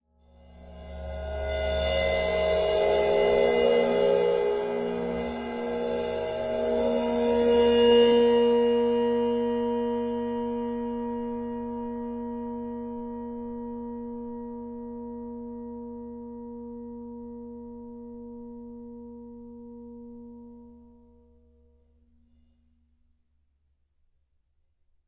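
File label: Ride 22 Cymbol Bow close up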